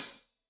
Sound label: Hat Loose, Hi-Hat, Cymbals Stereo; Deeply Sampled Drums